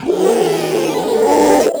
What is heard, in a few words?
Proto Infested Ancient Strike Scream; Warframe; Abilities